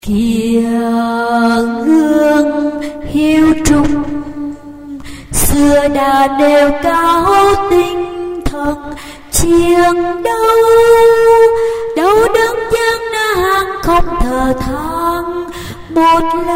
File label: basso